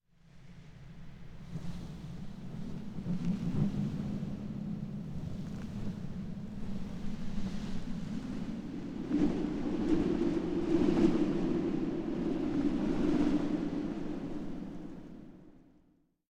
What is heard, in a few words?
Wind Gusts Reverb - Medium Cold; Hollywood Edge; sound designer's toolkit v2